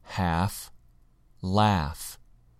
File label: half laugh